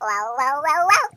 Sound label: Minion Hit